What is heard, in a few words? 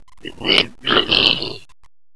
attack; sawer; boss